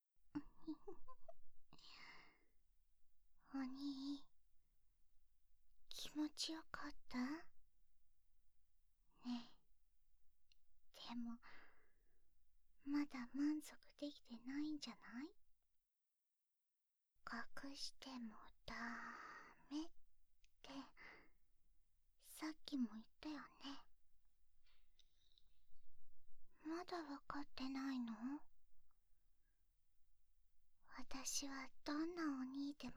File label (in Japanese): ゲップ